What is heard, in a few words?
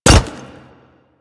Pet Step Default; Warframe; Alad V Pet